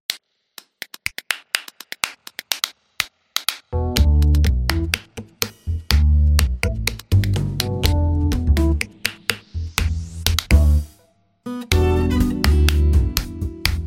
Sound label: The Clap 20s